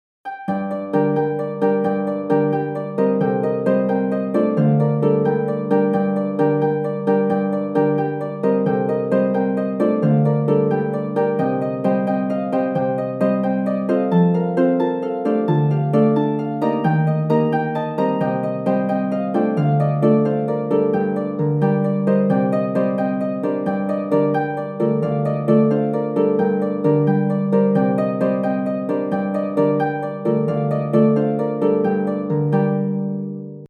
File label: Abbots Bromley Horndance Tune